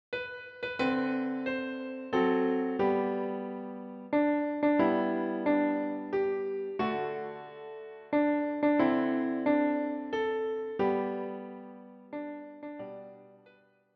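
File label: piano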